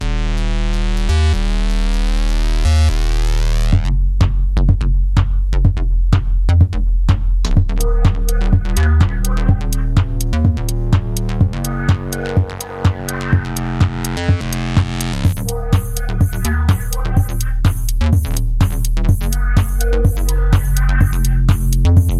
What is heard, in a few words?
Synth Bassline; ik multimedia uno synth; سینتی سایزر و سکوئنسر iK Multimedia Uno Synth